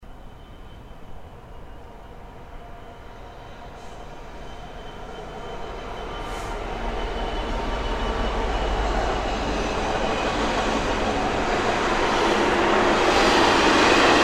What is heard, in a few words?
Lonesome Freight